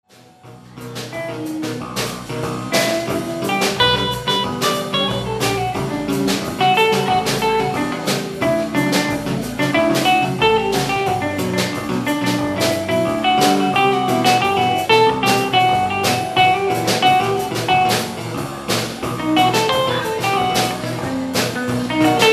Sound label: Bossa Tape Solo Excerpt